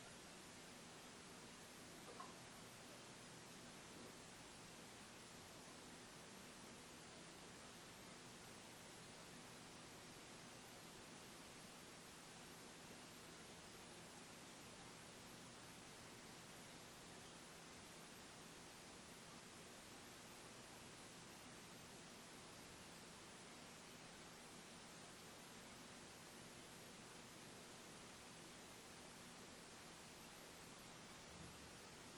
Inside Tattoo Shop

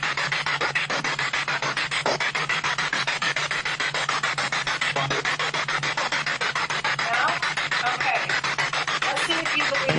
Spirit Box; Basment 2; gill house investigation

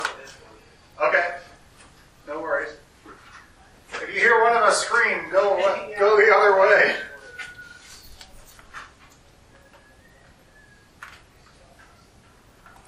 Following Whistling

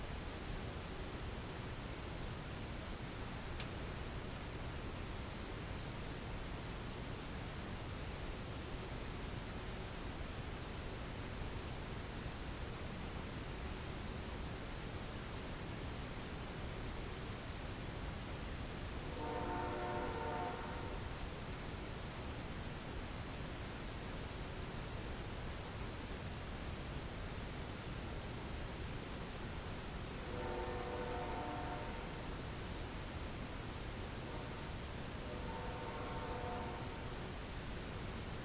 Tattoo Shop Static

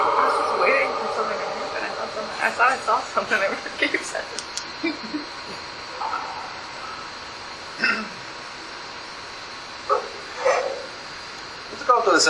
Basement Spirit Box; wildwood sanitarium investigation

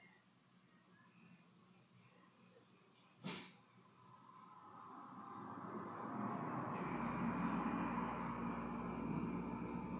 Empty Room; historic rainworth lodge investigation

